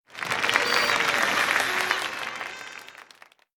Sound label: Applause